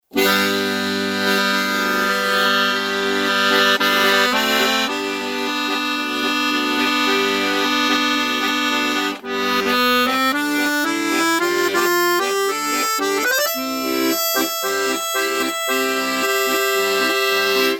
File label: accordion